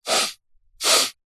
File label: human male middle aged sniffing